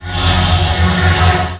BEATTACK

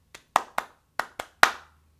EMOT CHEER

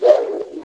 JUMP

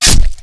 BEATTACK

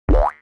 jump

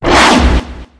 lucky attack

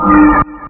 BLINK

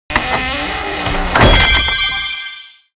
TREASURE BOX

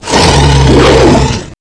attack

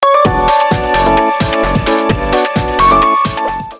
emoticon

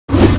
ATTACK

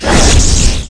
ATTACK

